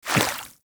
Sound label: Small Walk Water, Footsteps; Enemies; Warframe